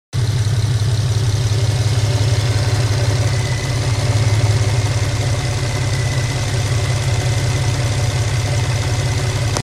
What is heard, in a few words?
engine noise 09 g37 coupe